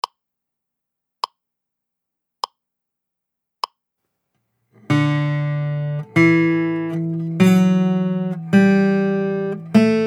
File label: D Scale Small